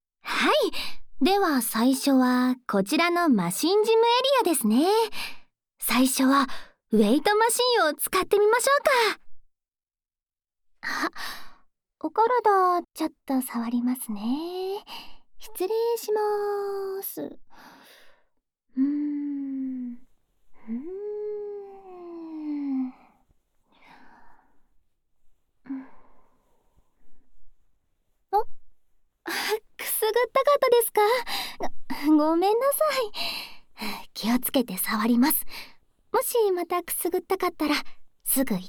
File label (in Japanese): 筋トレASMR・耳元吐息; 三角筋を鍛えましょう！